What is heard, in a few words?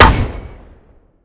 brico shoot, weapons